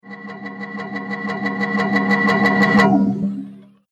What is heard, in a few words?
Pulsating Tension; Evil Sound Effects